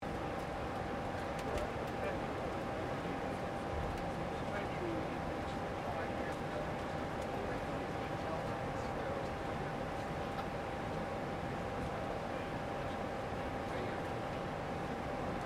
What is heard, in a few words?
Airplane Boarding, Transportation, Aircraft, Airplane, Boarding, Interior, Perspective, Commercial, Airliner, Loop; SoundEffects-BlastWave